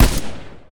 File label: akm silencer; STALZONE